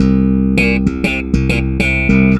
SWEET & LOW BASS

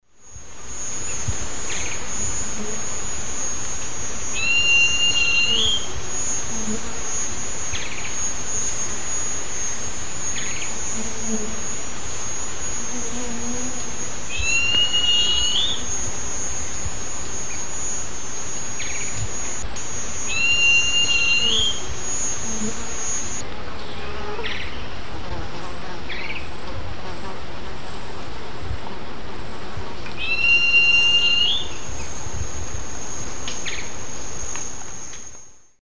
Pithys castaneus, song; S. America; Eastern Lowlands

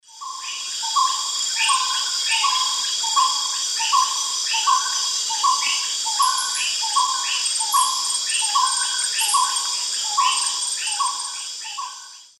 Odontophorus gujanensis, song; S. America; Eastern Lowlands

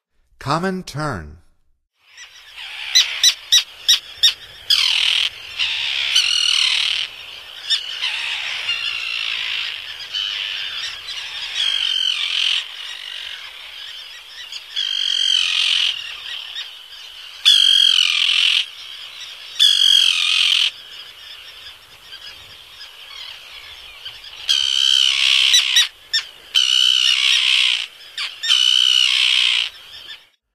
Common Tern; North America; Stokes

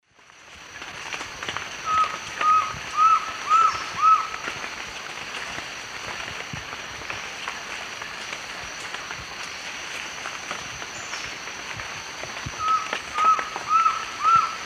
Trogon rufus, song; S. America; Eastern Lowlands

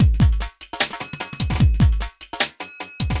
clout 150

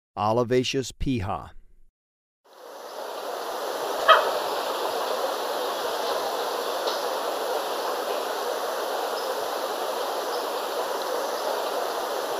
Olivaceous Piha, Birds of Ecuador; S. America

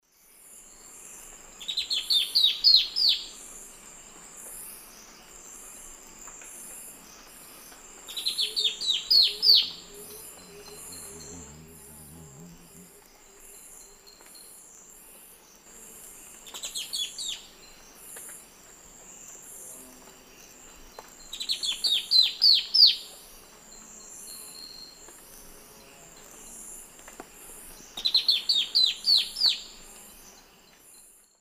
Myrmeciza atrothorax, song; S. America; Eastern Lowlands

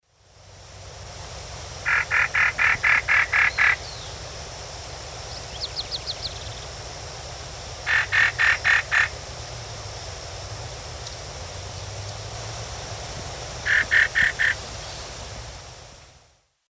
Taraba major, call; S. America; Eastern Lowlands

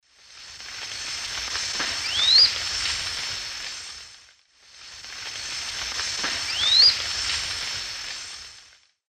Pipra filicauda, call; S. America; Eastern Lowlands

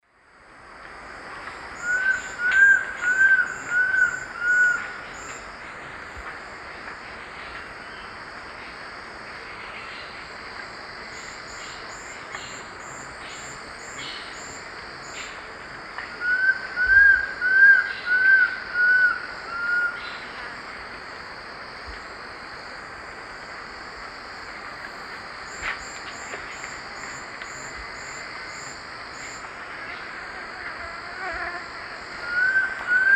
Cymbilaimus lineatus, song; S. America; Eastern Lowlands

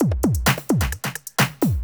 electro kit 130

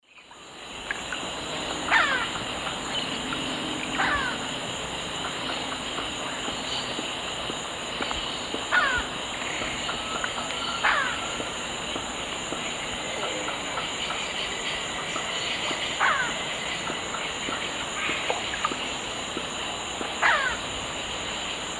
Nasica longirostris, calls and flight calls; S. America; Eastern Lowlands

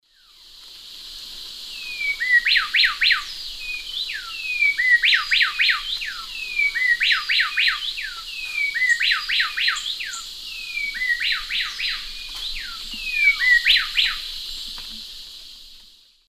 Pheugopedius coraya, duet; S. America; Eastern Lowlands

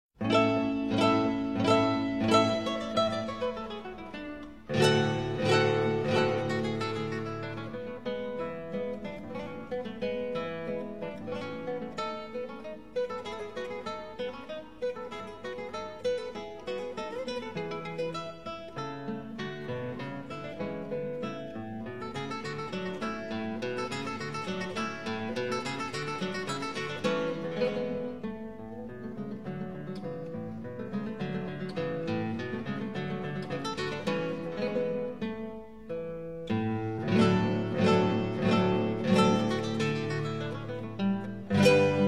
Spanish Guitar Music; Danse du Corregidor

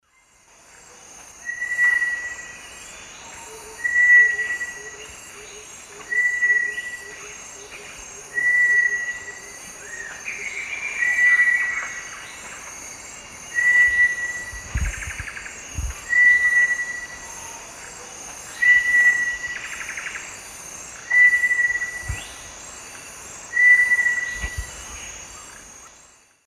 Crypturellus cinereus, song; S. America; Eastern Lowlands

